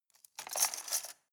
cutlery plastic multiple drawer place

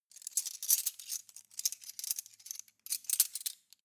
cutlery teaspoons handle long

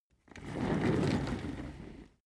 office chair trundle fast long

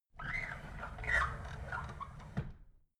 drawer big squeak close soft

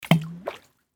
stone falls in the waters

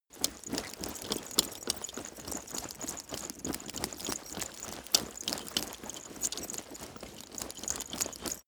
leather metal movement soft fast sequence

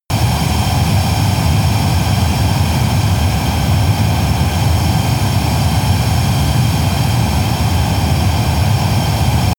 military jet fighter turbine steady tonal

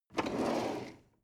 drawer medium open draw short

